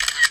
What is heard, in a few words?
Iphone Camera Sound